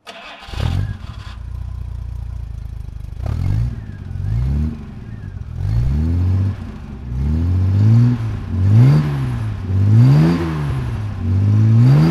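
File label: jaguar etype s2 fhc throttle, engine sound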